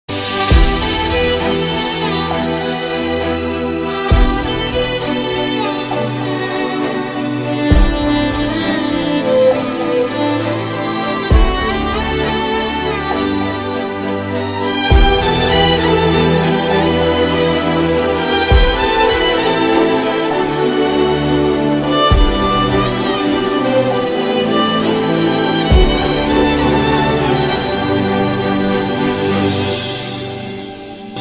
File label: violin bgm ringtone telugu christian ringtones; jesus ringtones